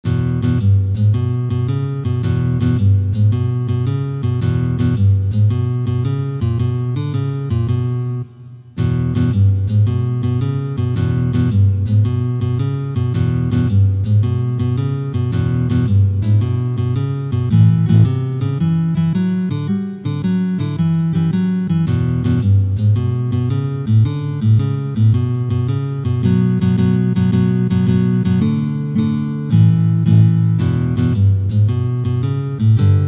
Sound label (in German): 14bar Blues Bass Stimme laut